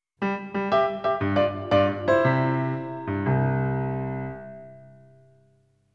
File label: Game Over; NINTENDO